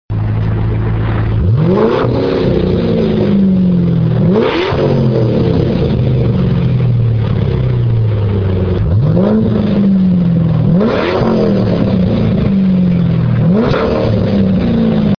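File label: Ford Mustang GT; 46l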